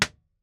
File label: Blaupunkt tube radio, IR, Speakers and telephones